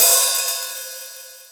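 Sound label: R8-OHH, R8 open hi-hat; E-Lab; X Static Goldmine 1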